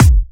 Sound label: Hard Kick; Vengeance Samples